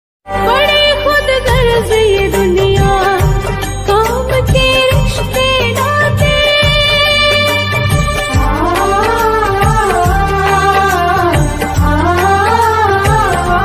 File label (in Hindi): Radha Krishna Bhajan ringtone bhakti ringtone